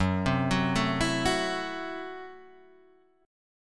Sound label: F sharp Minor 7th Flat 5th F sharp